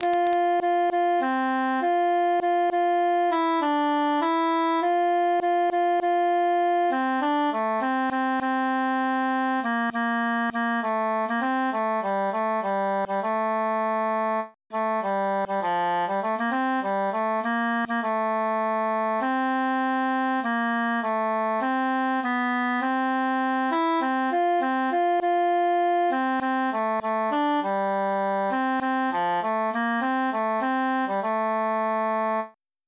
tenor solo; fine knacks

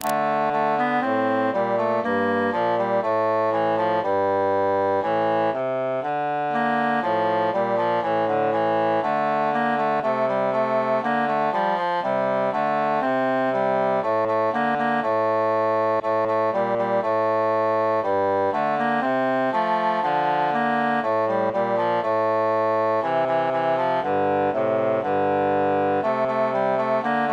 plaude cantus mute

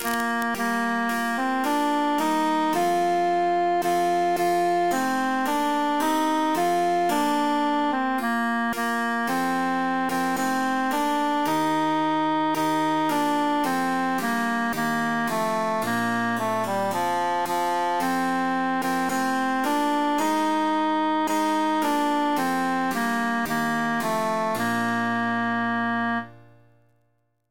tenor emphasized; es ist ein schnee

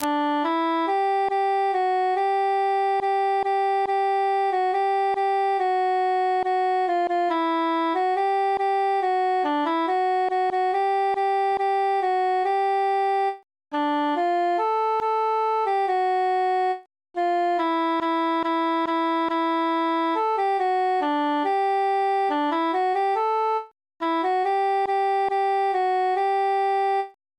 altus solo; now is the month of maying

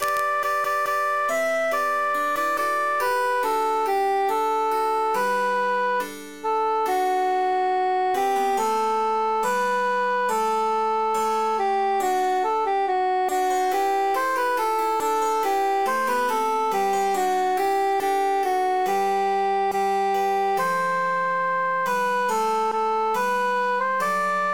cantus emphasized; come merry lads

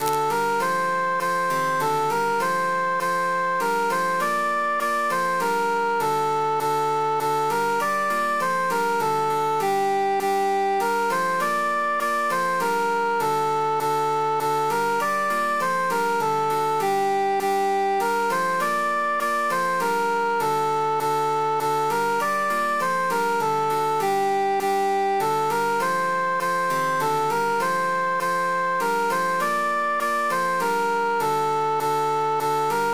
cantus emphasized

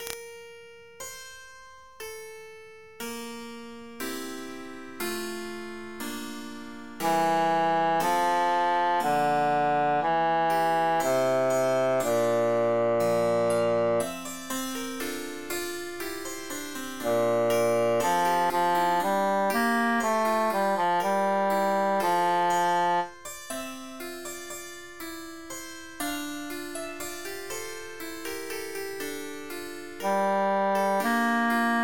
bassus emphasized

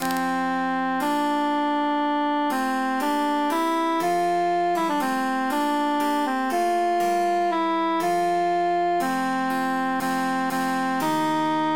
altus emphasized

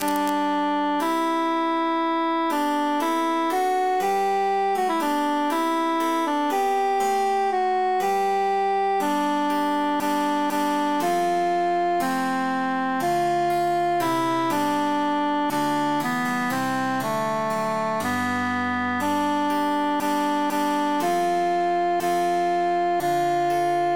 transposed altus emphasized

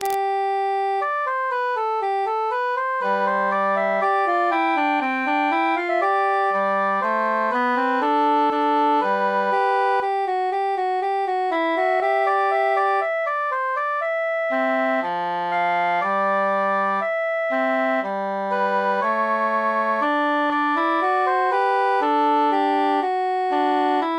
nightingale altus mute

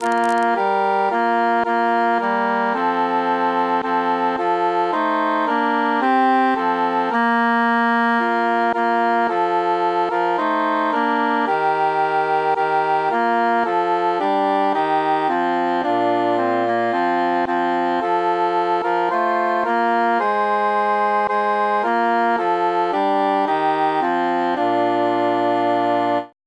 tenor mute; this evenfall tis snowing

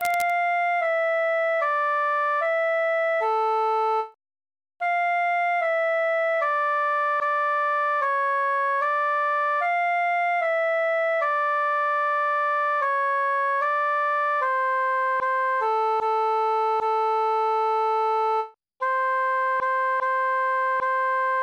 altus solo; ave maria gallus

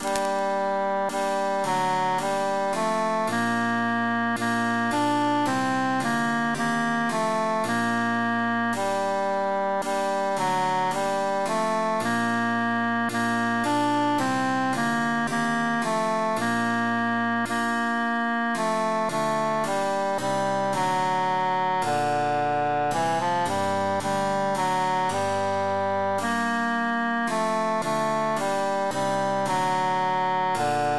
cantus emphasized; belle qui

